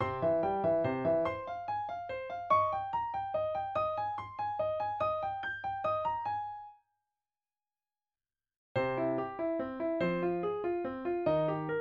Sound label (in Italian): Trio in la bemolle maggiore